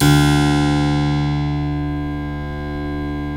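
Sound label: D1 clavinet left, Keyboards Acoustic; USB Soundscan vol.17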